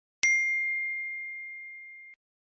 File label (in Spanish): Sonido de notificación de iPhone